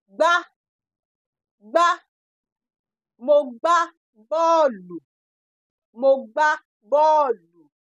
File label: kick